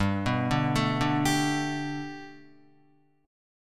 G Major Add 9th, Guitar; arpeggiated; 4F,4A,5C,5G,5C,6E

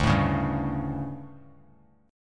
D Major 7th Suspended 4th, D, down, Bass Standard; DM7sus4; strummed; JGuitar

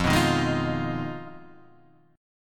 G sharp Minor 6th F

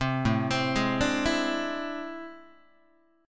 A-Minor Major 11th Guitar, AmM11 chord; arpeggiated; JGuitar

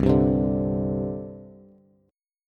C Suspended 2nd, C, down, Bass; Csus2 strummed

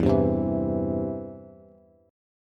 E Minor Sharp 5th E down Bass; Em#5 strummed; JGuitar

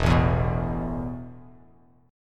E Suspended 4th E, down, Bass Standard

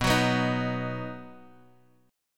B Major, Guitar, DADGAD

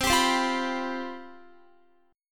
C Major 7th Suspended 4th, down, Guitar

